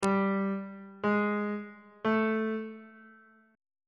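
c sharp diminished 5th perfect 5th augmented 5th intervals on bass clef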